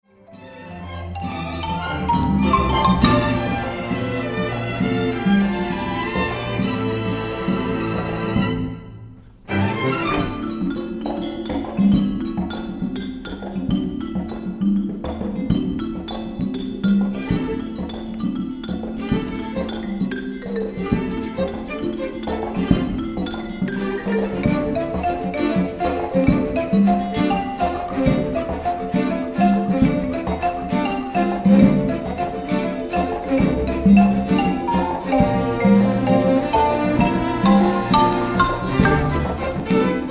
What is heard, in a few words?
marimba